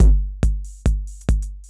DRUMLOOP TEKNO 140; Techno2

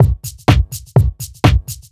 HOUSE BEATS 125

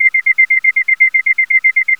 ALARM LOOP; KillerTweaksII; DirectWave

